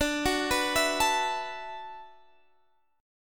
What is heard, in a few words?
D 6th Add 9th D, Guitar, Standard; arpeggiated; capo 9